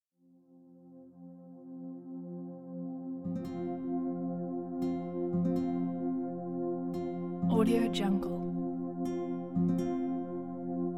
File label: peaceful flute and uilleann pipe melody